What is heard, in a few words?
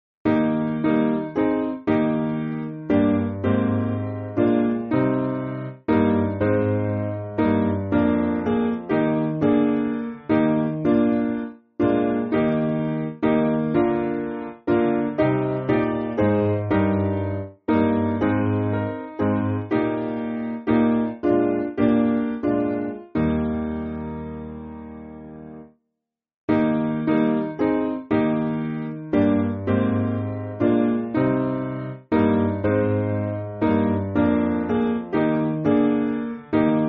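Piano, Music Backing Files for Churches; Happy The Man; Blockley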